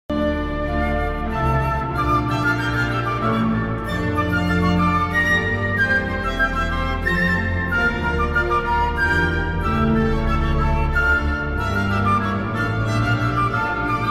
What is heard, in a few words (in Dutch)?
Instrumentaal; Rondeau uit Abelazer